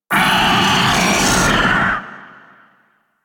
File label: Vocal Pain, Enemies; Warframe; Teralyst; Ropalolyst